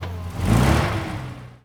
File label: vehicle tank ignition